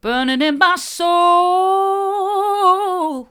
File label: burning in my soul hard, vocal